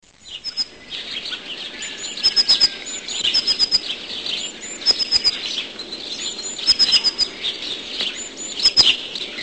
blaumeise, singvoegel

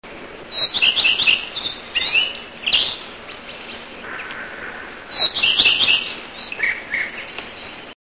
gartenrotschwaenzchen; singvoegel

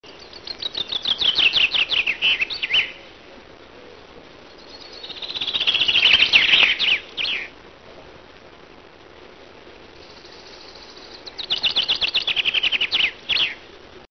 buchfink; singvoegel

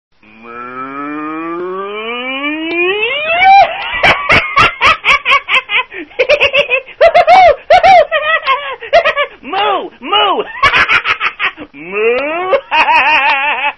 kuh

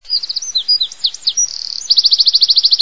zaunkoenig, singvoegel

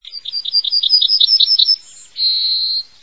goldammer, singvoegel

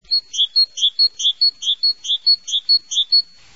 kohlmeise, singvoegel

